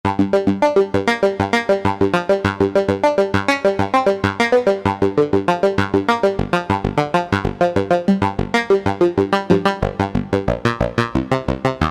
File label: Analog; Generalmusic S2R